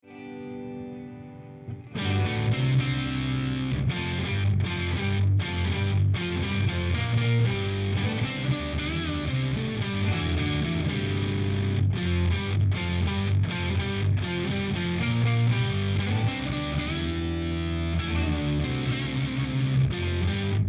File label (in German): Sub N Up octaver gitarre verzerrt polyphonic; tc electronic